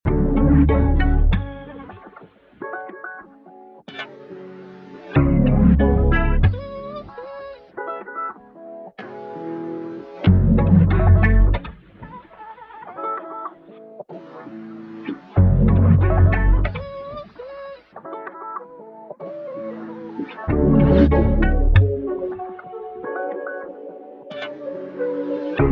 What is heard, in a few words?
94bpm F minor